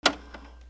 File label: HOUSE